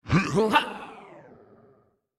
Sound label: Triple Swipe